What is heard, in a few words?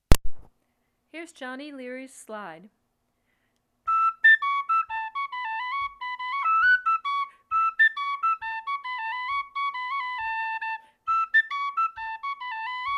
Johnny OLearys Slide